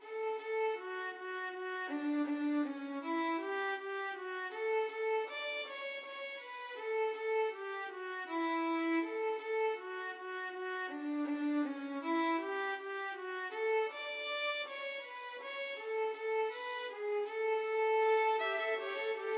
manly heart violin duet

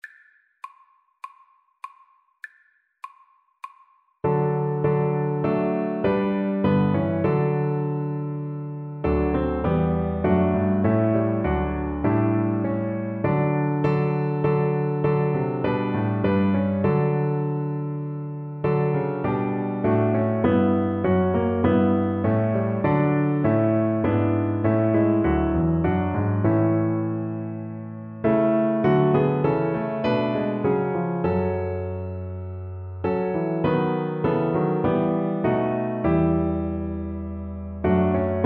Cello

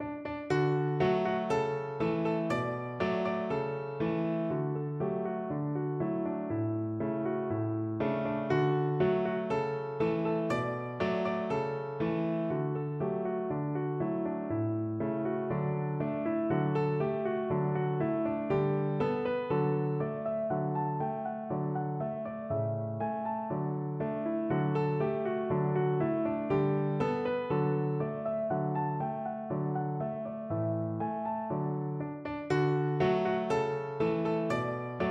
ecossaise pno

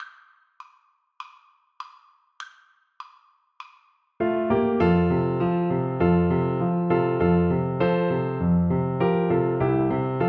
tuba

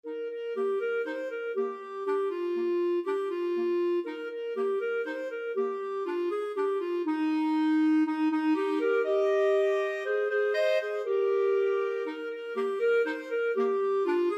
clarinet duet

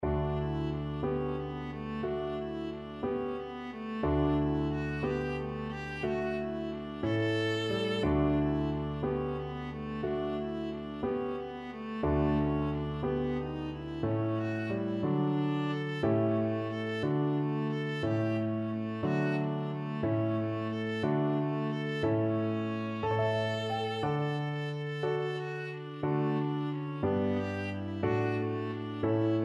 slumber song, viola; dorn

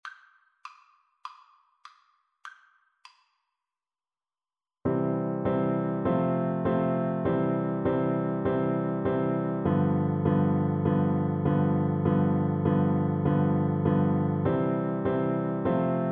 Bassoon

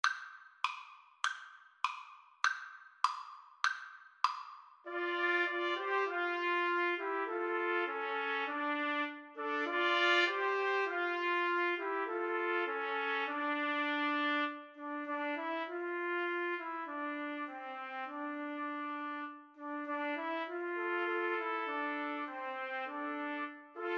Trumpet Trio

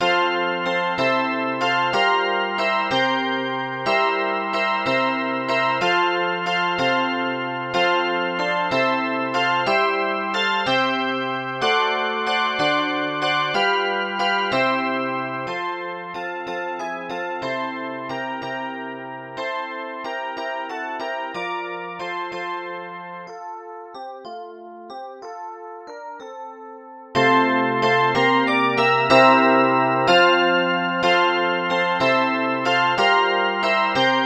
handbells

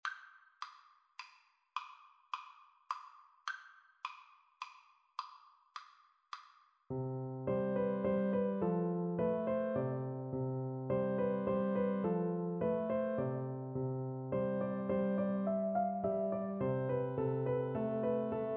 lakme viola

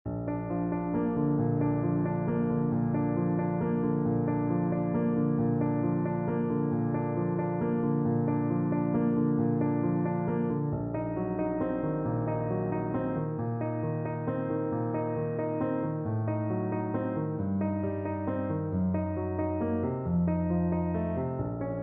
Clarinet

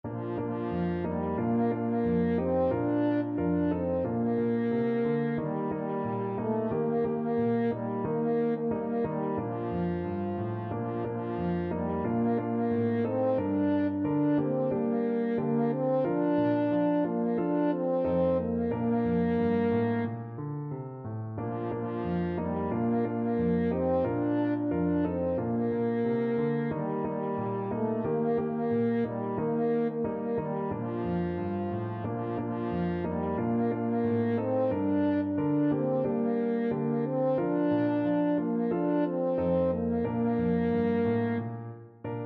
french horn

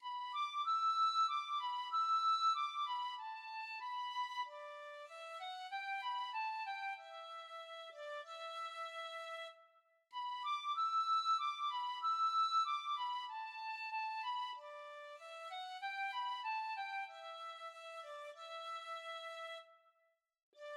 the foggy dew, tin whistle

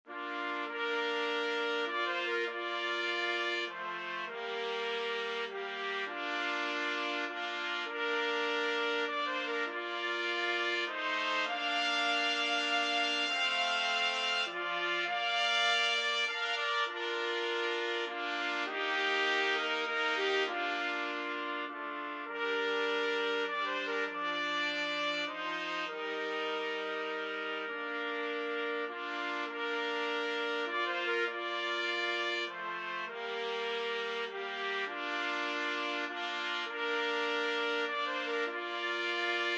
amazing grace trumpet trio

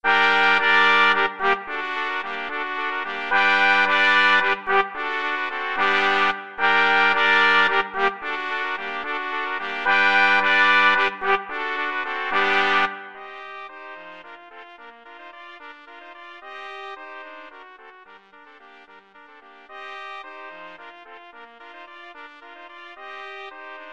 trumpet quartet

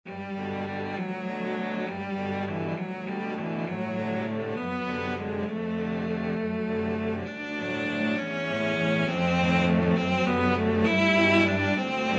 cello quartet; waltz

